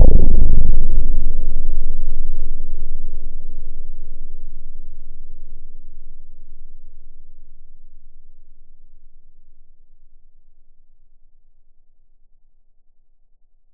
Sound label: Aux Explosion